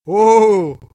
ooooooooooh

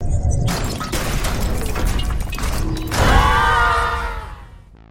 scream; bfdie

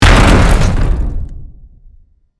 final explosion

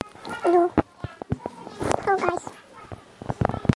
chipmunk

